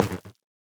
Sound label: Fungus break; minecraft